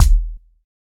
bright bass drum sound d sharp key, bass drum one shot tuned to the D# note; kick drum samples